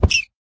hurt, rabbit; minecraft; mob

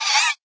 land idle, guardian; minecraft; mob

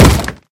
wood, mob zombie; ayuncraft-1.5

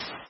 sand, step

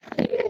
idle, endermen; ayuncraft-1.5

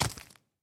step, mob zombie; ayuncraft-1.5

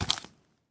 step, mob zombie; ayuncraft-1.5

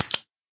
flop, guardian; minecraft; mob

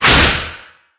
shoot, wither; minecraft; mob

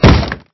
wood, mob zombie; ayuncraft-1.5

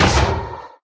hit, iron golem; minecraft; mob

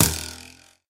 bow hit; ayuncraft-1.5

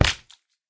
land hit, guardian; minecraft; mob

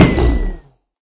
hit, enderdragon; minecraft

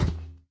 walk, iron golem; minecraft; mob

